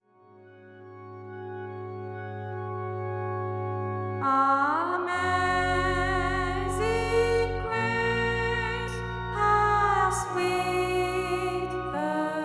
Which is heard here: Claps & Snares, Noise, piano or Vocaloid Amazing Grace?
Vocaloid Amazing Grace